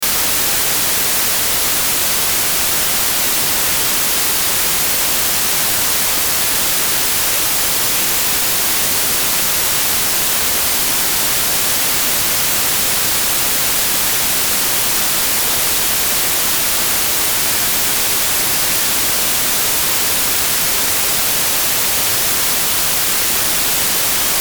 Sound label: White noise at 12 dB FS Both channels in phase